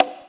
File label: Conga; Equipped Music; Loungin House